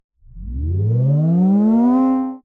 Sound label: Tape Start